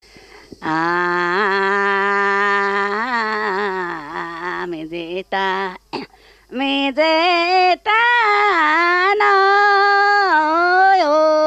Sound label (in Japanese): medeta medeta, minyou